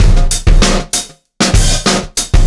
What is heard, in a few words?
Beat, Processed Drumkits; Event Horizon